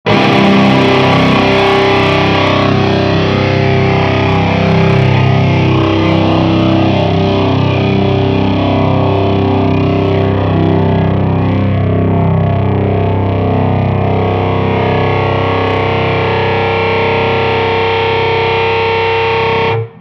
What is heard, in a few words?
sustain